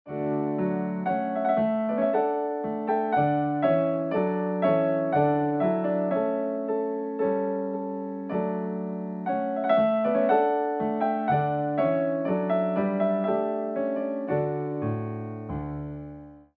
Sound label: Trumpet Voluntary Slow; Clarke